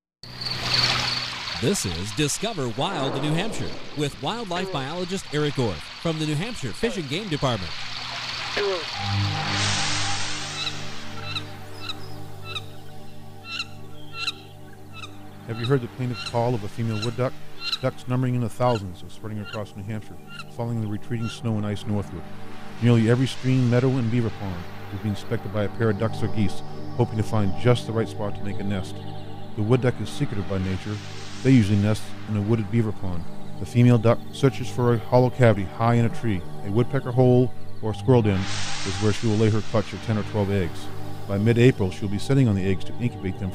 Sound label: Ducks; NH Fish and Game